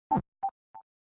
rule complete; baba is you

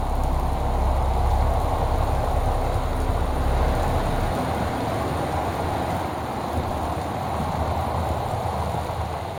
atmosphere

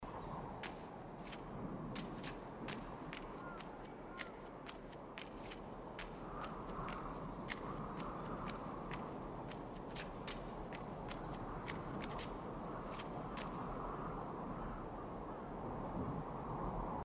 soundscape desert wind; gris

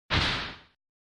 destroy item; baba is you

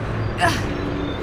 pull up; mirrors edge

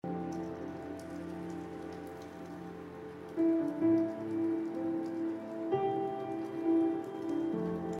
bg music; The Cat Lady

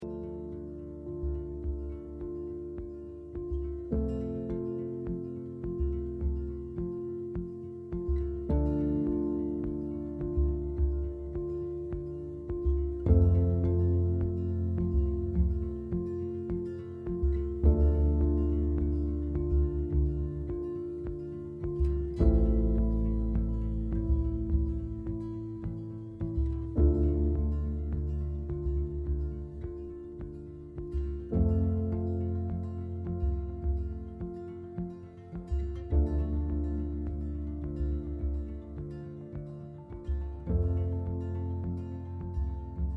morning camp music; Life is Strange 2; gamesoundopedia

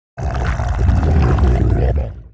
zerg variation; starcraft ii 2010

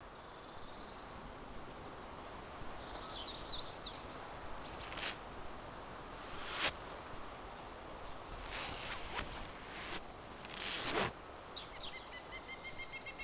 action bandage; dayz standalone

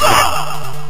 enemy boss death; kung fu master

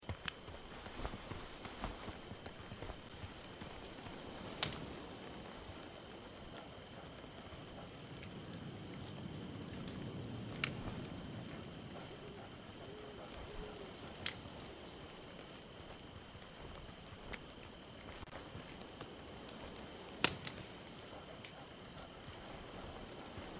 camp night hintergrund; Life is Strange 2; gamesoundopedia

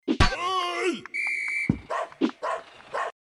mistake; Subway Surfers

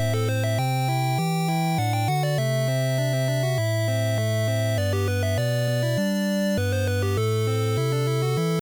arabiamusic; 8 eyes

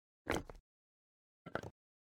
pick up and drop slingshot stone; baldursgate

